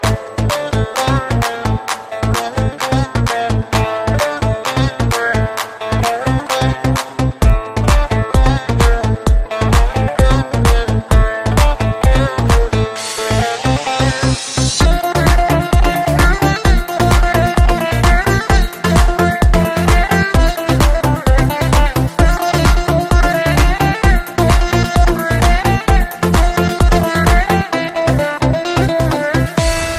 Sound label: ringtone; albani